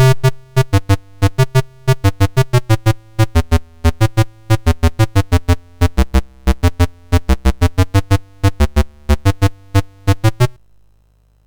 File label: sid MOS6581R2; Popeye